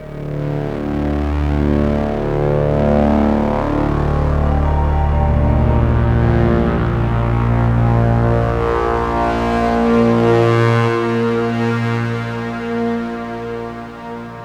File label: Sandman Saw; Sonic Foundry (Sony Creative Software); Ma Ja Le; Soundworlds